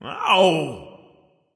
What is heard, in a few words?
ouch; chaac